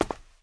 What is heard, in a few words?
concrete; firefight